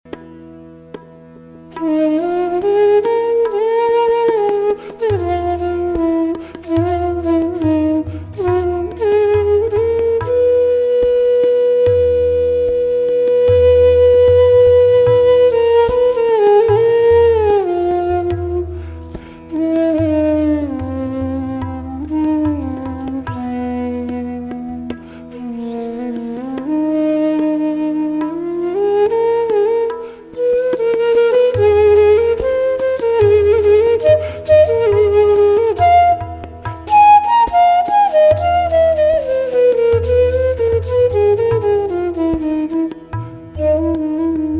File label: Vilambit Teental Pooriya Dhanashri E